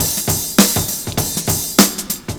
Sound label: 70s Breakbeats; USB Soundscan vol.46; AKAI